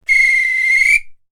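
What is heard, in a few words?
referee whistle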